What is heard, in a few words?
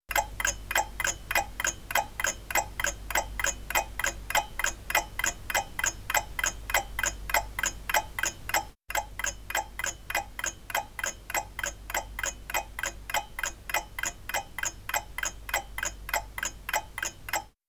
neu u87ai noise